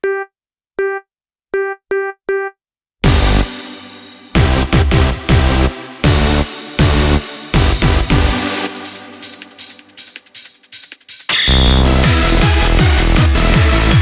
instrumental

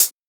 Closed HH, PsyTrance; Cymbal

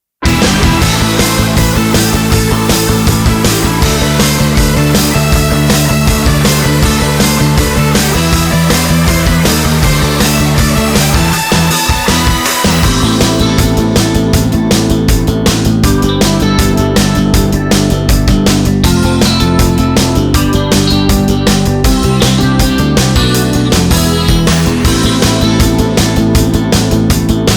instrumental

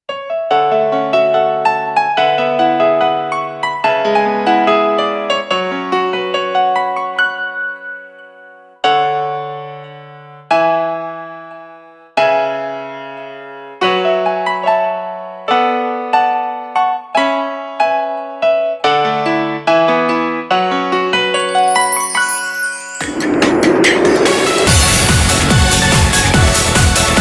instrumental

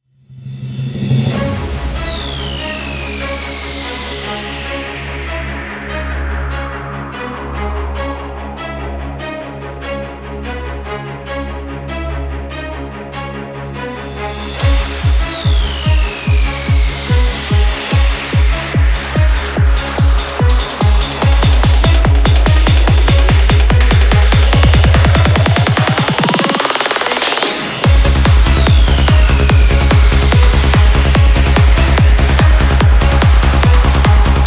Instrumental

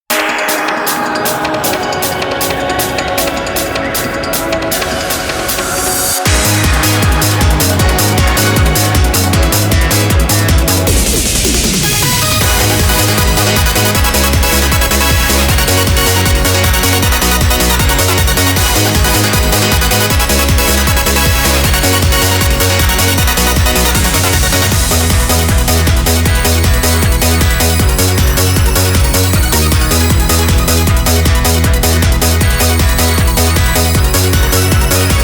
instrumental

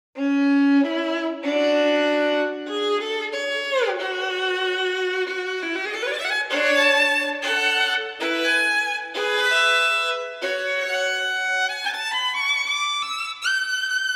ViolinSolo

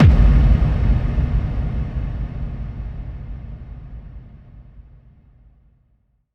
Kick FX

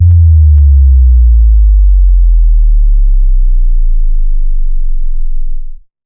Sub Drop

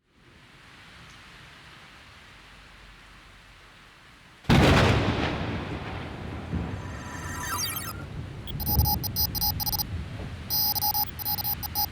BLACK RAIN, Instrumental

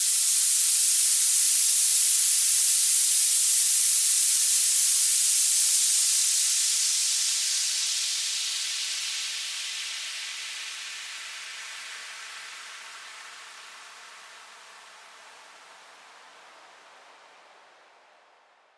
White FX

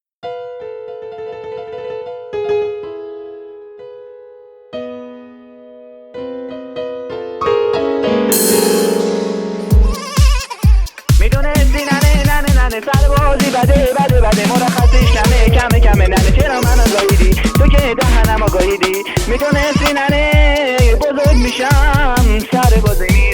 liver damage type beat

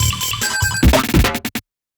FILL, PsyTrance

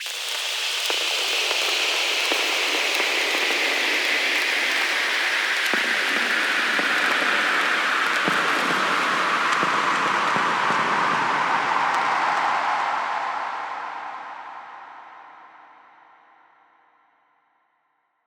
Atmosphere - 140 BPM - F

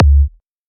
Kick; LiLA'c Records PsyTrance Sounds 01

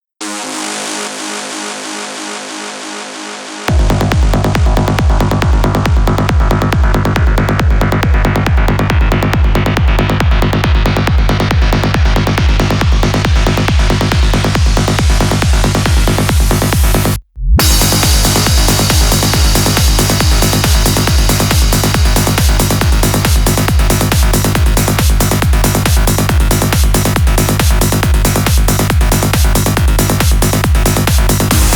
dissonant wind

